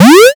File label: jump